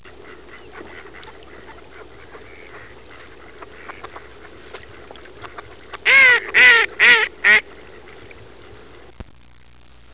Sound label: mallard